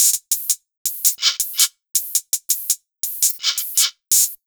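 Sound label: 110 hi hat loop, Ragga & Dub Reggae; USB Soundscan vol.27; AKAI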